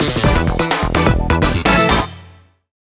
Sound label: Jingle Game Start; Gate